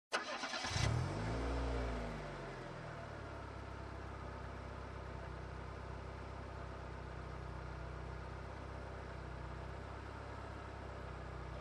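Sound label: Car Driving in circles on dirt road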